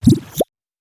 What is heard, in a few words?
Shoot